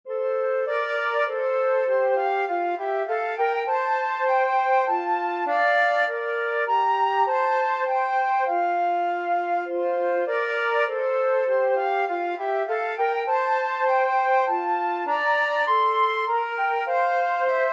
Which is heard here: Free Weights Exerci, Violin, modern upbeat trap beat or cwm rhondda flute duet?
cwm rhondda flute duet